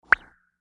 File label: Bubble Pop High